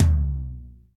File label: roomy tom sample c key